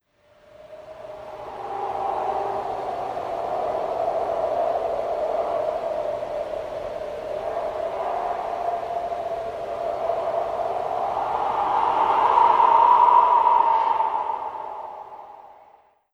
creepy wind

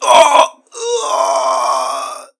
death; doctor

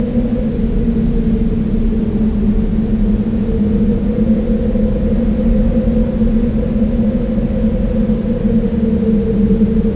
winds

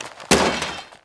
law discard, weapons

